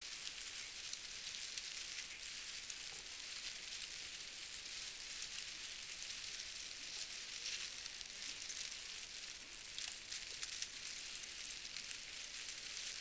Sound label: Jungle Fight amb